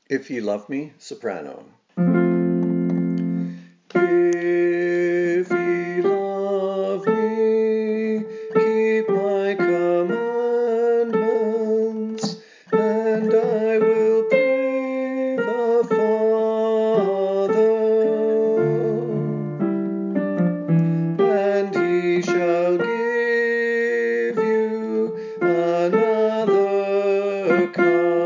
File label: Soprano; Tallis; If ye love me